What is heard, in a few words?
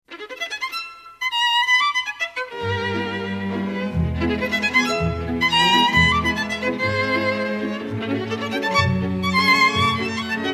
Wonderful violin, music from Hungary